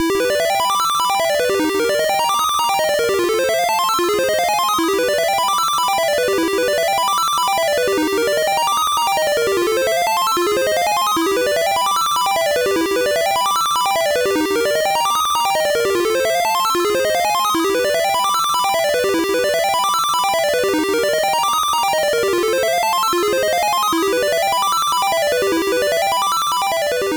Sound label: Continue; The Bugs Bunny Crazy Castle; NES